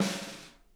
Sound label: snare, drum kits